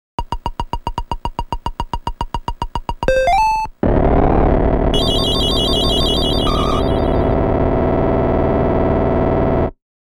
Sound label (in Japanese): ステージクリア; All Target Cluster